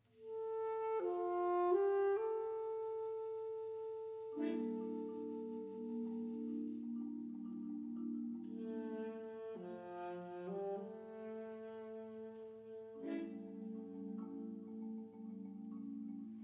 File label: Fanfare